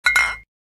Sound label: bottle clink